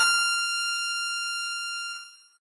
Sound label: piano; pianojs